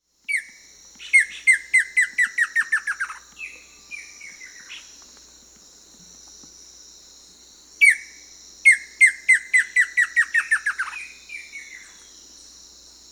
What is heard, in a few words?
White-lined Antbird (Percnostola lophotes), Bolivia; S. America